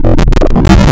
FM - Complex Non Sine, wavetables, Frequency Modulation; Architecture 32